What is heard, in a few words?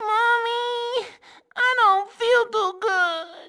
sick; nwnds audio